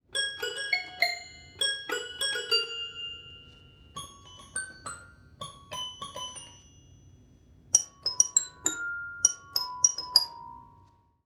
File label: toy piano melodies